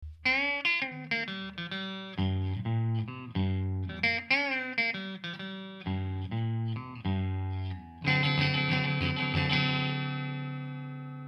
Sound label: tele chevalet manche